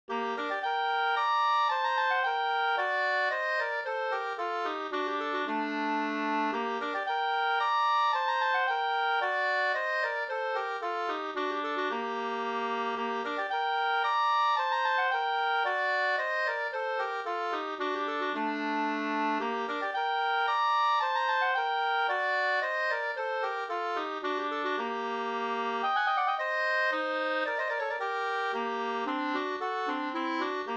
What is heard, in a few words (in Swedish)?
polska Moll